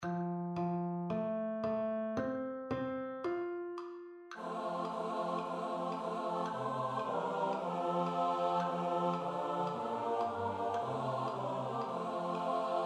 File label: SATB STUDY